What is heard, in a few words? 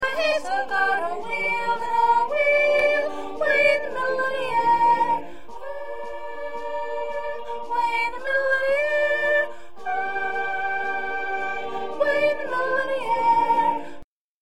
1st Soprano, choir